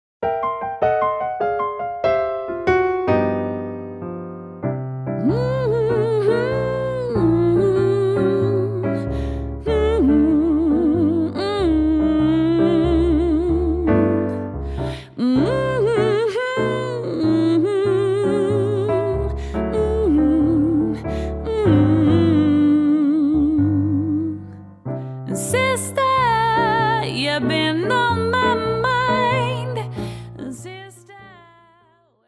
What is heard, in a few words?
Blues